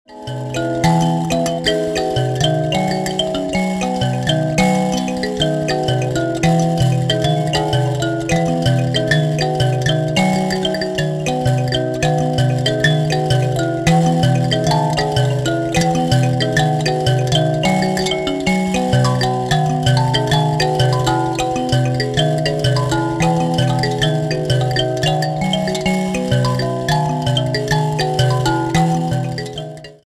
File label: mbira